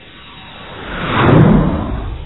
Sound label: F15 Fighter Jet Flyby